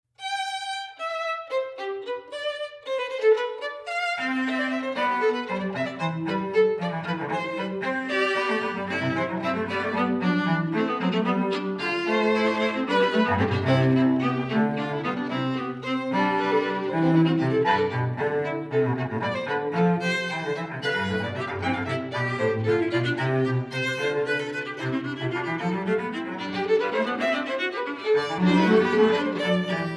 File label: Trio in D Presto